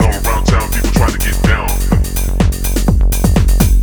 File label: Techhouse Kit Groove